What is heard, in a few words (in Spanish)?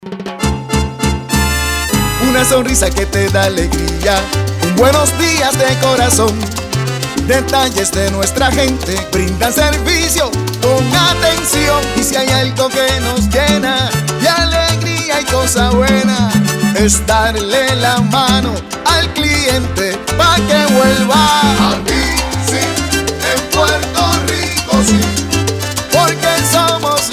salsa